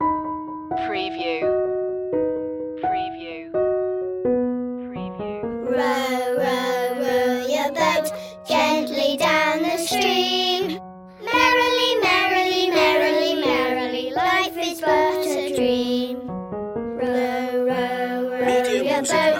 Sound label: children vocals; row row row your boat